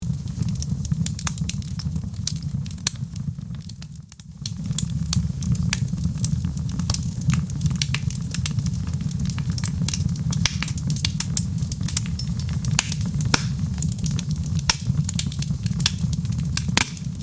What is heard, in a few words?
fire crackling sounds